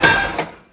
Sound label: Zipline Stop; Playable Tutorial Level; TR2